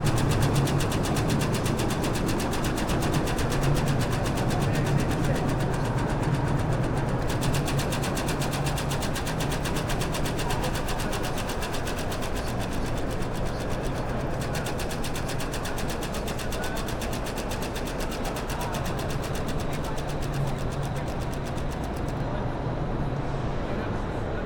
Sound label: train station flipping signs